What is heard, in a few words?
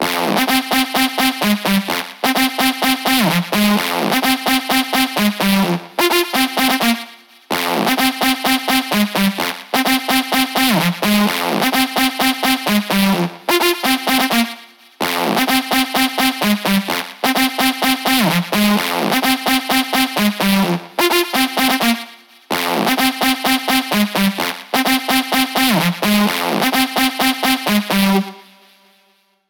128 BPM lead Synth Wet noSC, G; Vengeance; Essential Clubsounds Vol.5; Demo Constr Kits; Sidewalk Kit